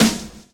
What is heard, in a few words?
DRUM CHOP; AMG Gota Yashiki Groove Activator